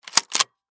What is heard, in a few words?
Gun Shotgun, Gun, Shotgun, 20, Gauge, Rack, Slide, Load, Bullet, Into, Chamber, Winchester, Model, 1400; Warfare